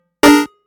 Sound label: jump